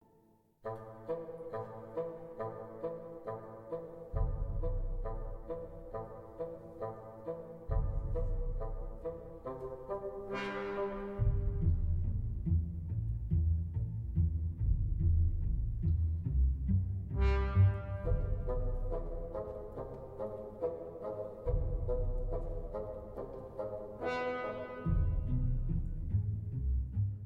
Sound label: piano